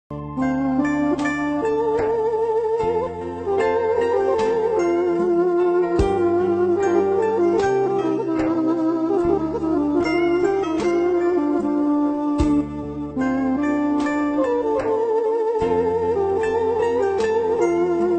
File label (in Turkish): fon muzigi; nihat hatipoglu